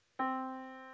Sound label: yamaha Disk